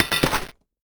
Sound Powerup Boardgame Metal Trigger

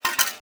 Coin Fall

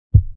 Sound Heart Beat

